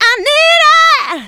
voc, female vox, Vocal House

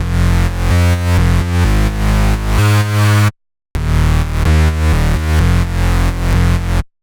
128BPM Bass Root F SC; Vengeance Samples; Guess What Kit

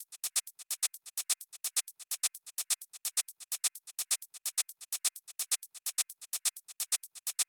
Freak Drums 2, 128BPM; Vengeance Samples; Freak Kit

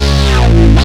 Synth Bass; Vengeance Samples; VEE Synth Shots

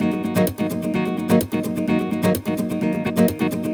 Nylon Guitar D# min, 128BPM; Vengeance Samples; VEH3 Nylon Guitar Kit 1

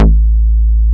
Bass Cuts; Vengeance Samples; VES1 Instruments

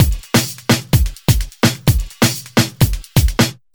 House Beat, 128 BPM

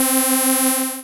Synths C; Vengeance Samples

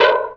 Special Hihats; Vengeance Samples; VEC1 Cymbals

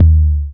percussion; Vengeance Samples

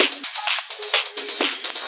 Electro Loop 128 BPM; Vengeance Samples

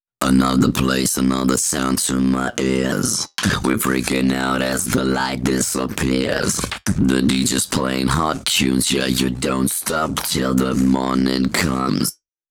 Pitched Vocals DRY, 140 BPM; Vengeance Samples; Song Kits

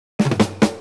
Fill Ins 128BPM; Vengeance Samples; VEH2 Loops

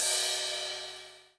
Cymbals crash; Vengeance Samples

